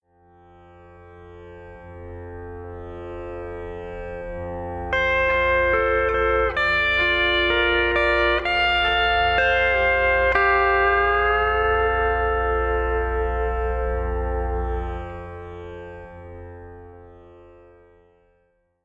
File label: Smooth drone, pedal steel